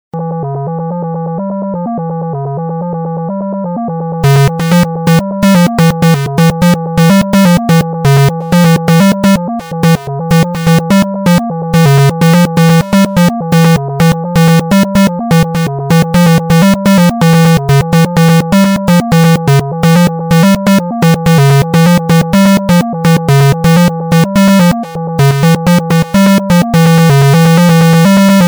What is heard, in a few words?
jam session with myself